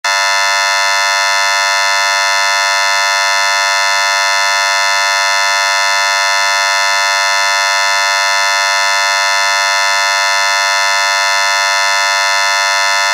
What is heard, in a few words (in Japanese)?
劇場やホールの開演ブザー 15秒 リバーブ無し; 演劇・舞台